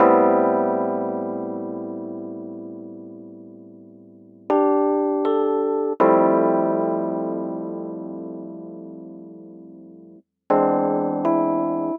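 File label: Pop Mark II, Amaj, 080 bpm, E-Piano; Pop Rock; E-PianoClav; Pro Tools Essential Loops; Digidesign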